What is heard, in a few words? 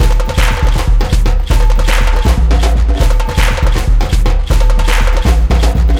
win big win loop super mega; rise of maya